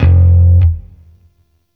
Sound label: Shawzin Oct One F; Warframe; Sentient Shawzin